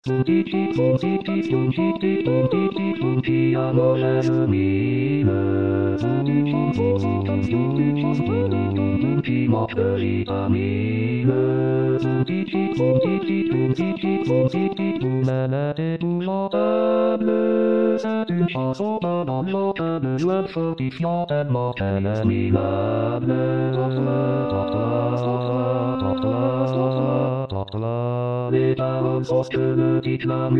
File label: Tutti